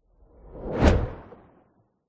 sound design whoosh very fast zoom whiiz by watery